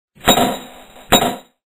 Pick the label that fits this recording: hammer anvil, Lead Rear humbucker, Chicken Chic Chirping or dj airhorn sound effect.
hammer anvil